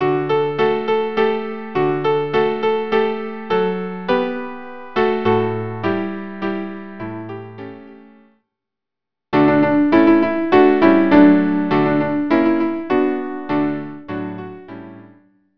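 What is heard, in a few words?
viola piano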